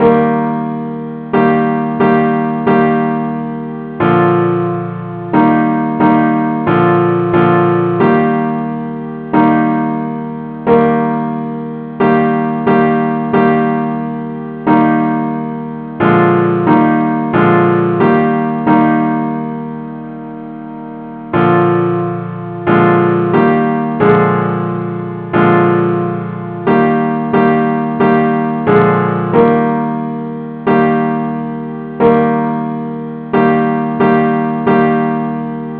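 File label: simple acc key E flat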